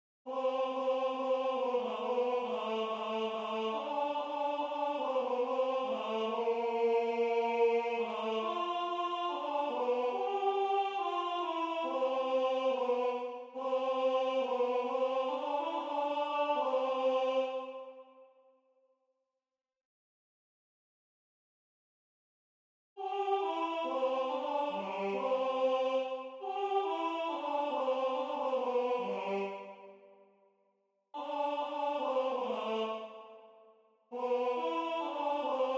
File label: MAGN Tenor; Durante; Magnificat